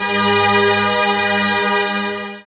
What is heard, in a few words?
PAD Dance; Best Service Gigapack 1